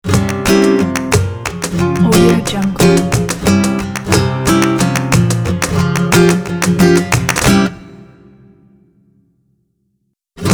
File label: flamenco logo